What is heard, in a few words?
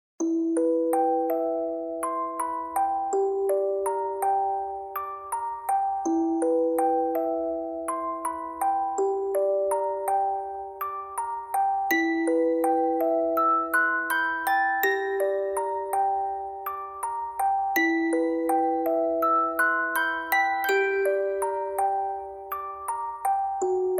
musicbox